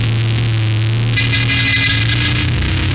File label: SUBSTATION, Funk Guitar; Zero G - Funk Guitar